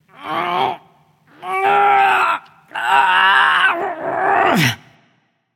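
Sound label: grunt lift, female; smite; voicepack; hera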